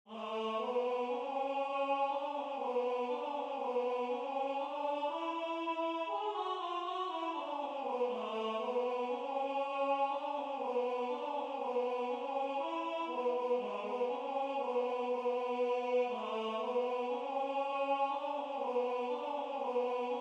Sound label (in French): cancan la vie parisienne alto